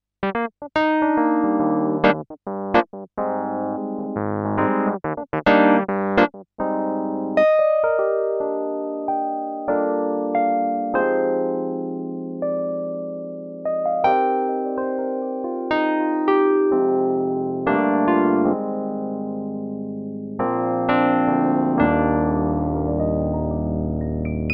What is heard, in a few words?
Numa Compact 2 Wurlitzer